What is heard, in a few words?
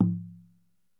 HOPI DRUM, American Natives; E-MU Formula 4000 Series Vol. 4; Earth Tones